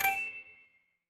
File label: feedback checkpoint